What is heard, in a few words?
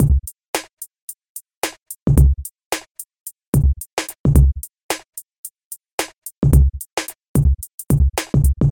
beat